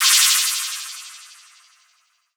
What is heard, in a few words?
Crash; Cymbals